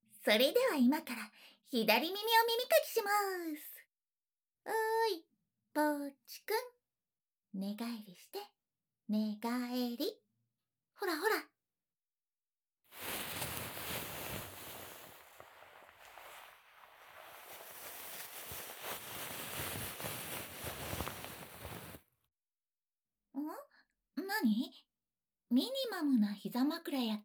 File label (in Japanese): 左耳も耳かきするばい